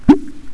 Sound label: bang; HALFE LIFE 3